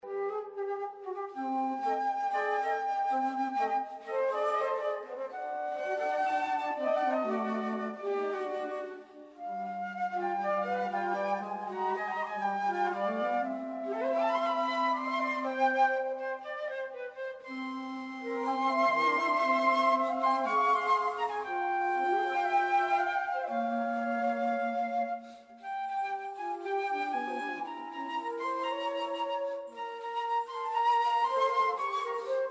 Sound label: 2 Flutes